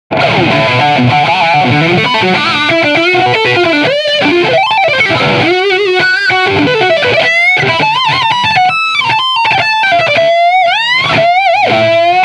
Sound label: raw solo